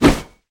basicattack; pantheon

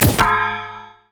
item sight ward horse gong; LoL

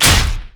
basic attack human; LoL; shyvana

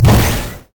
rocket jump; rocket tristana

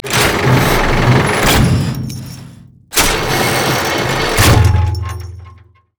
prop crane animated; odin

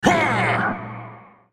effort; Nautilus; Character Voices - English

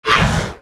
wraith dying; Character Voices; English

